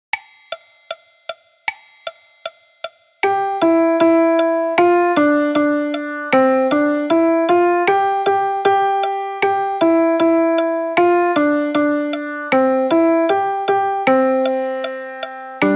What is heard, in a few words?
Piano Version